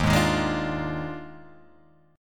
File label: D sharp Major 7th Suspended 2nd Suspended 4th, down, Guitar, Open G